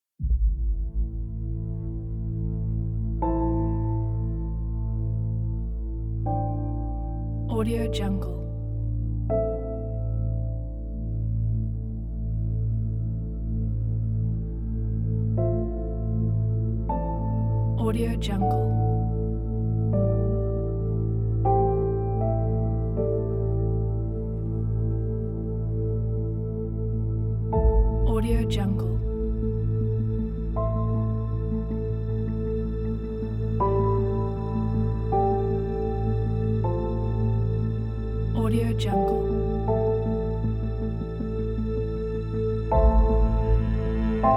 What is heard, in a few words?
documentary ambient background underscore